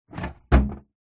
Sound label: office plastic file tray drawer close